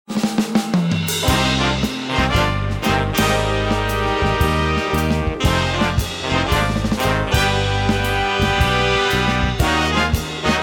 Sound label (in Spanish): big band accion